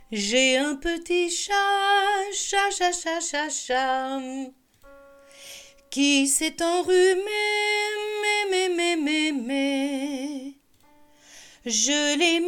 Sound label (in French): vocalises du chat